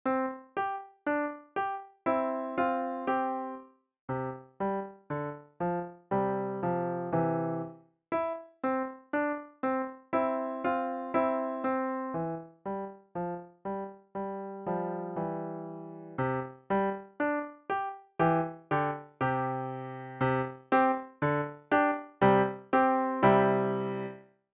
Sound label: Clock Rock